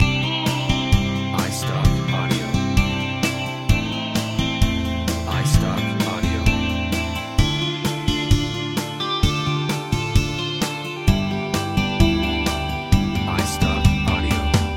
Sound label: Country Life Loop, Loop-ready file: Country, pop.; Music Clip; Instruments: Acoustic guitars, electric guitar, bass guitar, drum kit. C maj